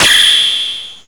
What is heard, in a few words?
Subs, Drum & Bass; Total Drum&Bass; 90 s Sample CDs; Hits & Bits